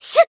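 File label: Voice attack, Japanese; suzette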